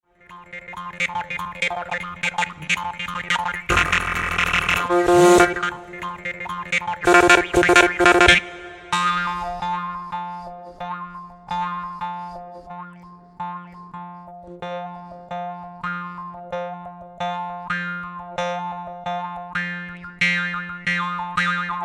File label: morsing